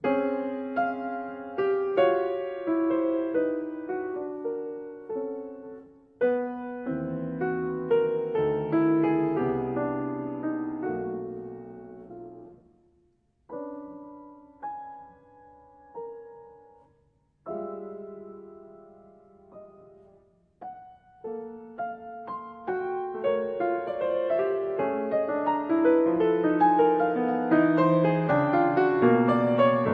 version for piano, Andante con Fantasia; Evocations

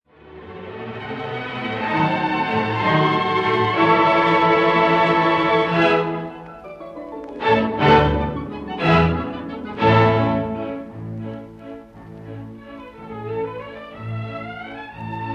for orchestra Un bal Valse Allegro non; Symphonie fantastique

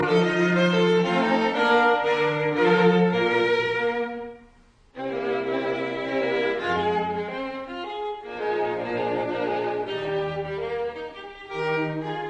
String Quartet No 5 in E flat major Menuet; Haydn

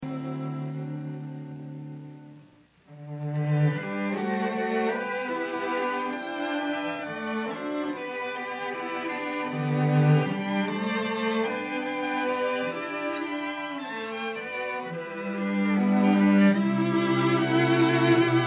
arr. for string quartet; Laura Lee

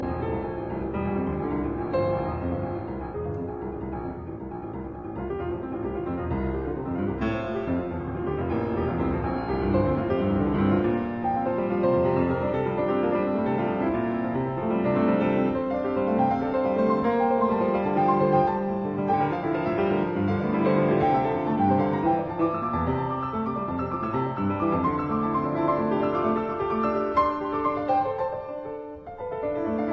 Preludes and Fugues Fugue in F major; No 5

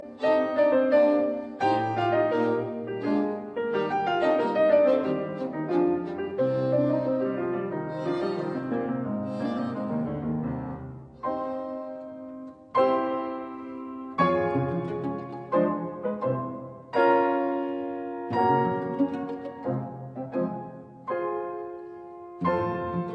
Piano Trio in C minor Presto non assai; Op 101; Mirecourt Trio

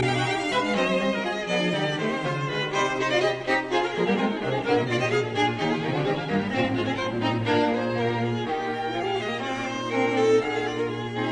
Fugue in C minor, Quartet; Mozart; Adagio and Fugue

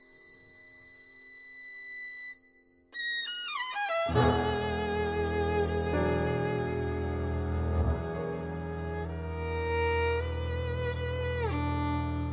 Blues

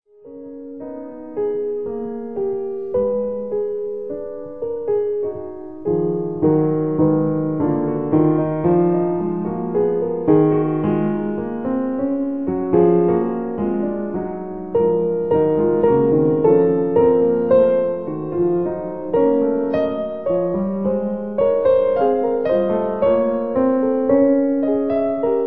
E flat minor Fugue; The Well-Tempered Clavier, Book II

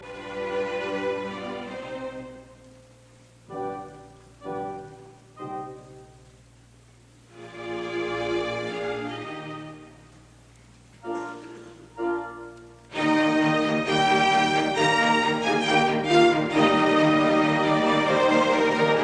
Piano Concerto in C minor Allegro con brio; Beethoven; Op 37

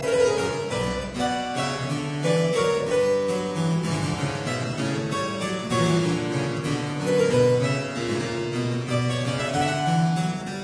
Lute Partita in C minor arr for harpsichord Fuga; BWV 997

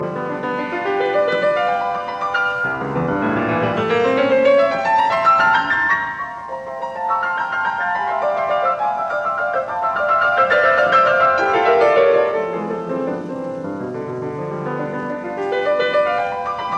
Variations in C major on a Waltz; 33 Variations; Op 120; Variation 26

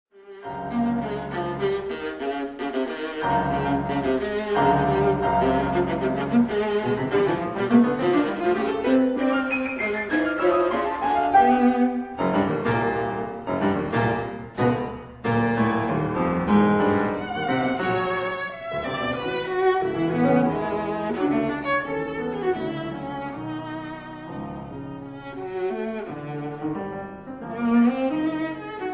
Sonata for viola & piano; Op. 244; Rude

